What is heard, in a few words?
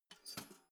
toaster pull down